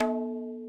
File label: madalam notes L